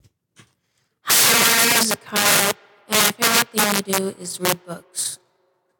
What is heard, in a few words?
vocal; Soundtrap